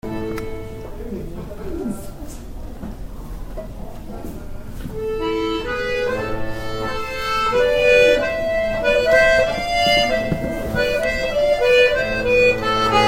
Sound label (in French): Vieux Passepied, France